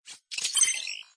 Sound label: switch